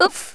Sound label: oof; Worms Armageddon; Thespian